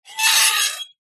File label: foley metal scrape squeak small